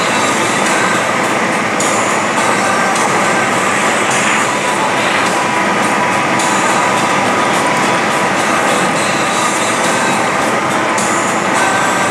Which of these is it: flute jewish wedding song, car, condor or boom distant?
car